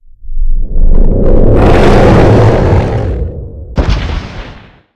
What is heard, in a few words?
Die